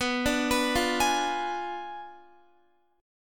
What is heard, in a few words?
B Diminished 7th B down Guitar; arpeggiated; tuning 4F,4A,5C,5G,5C,6E